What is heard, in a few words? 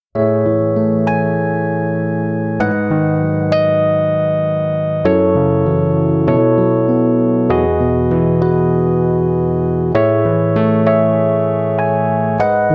instrumental